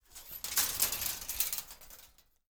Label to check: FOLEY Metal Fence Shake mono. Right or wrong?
Right